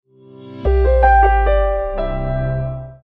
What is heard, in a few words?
Sonic Logo Rubato; Abbott